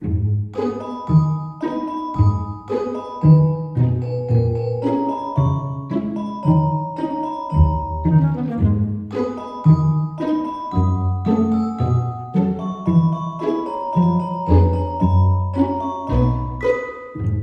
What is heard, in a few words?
Ballet; Everybody 1-2-Switch!